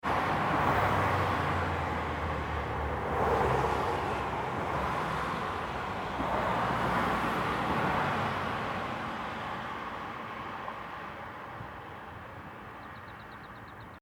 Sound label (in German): Autos aus dem Tunnel